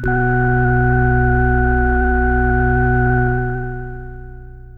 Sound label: Synth, Radio tone Chord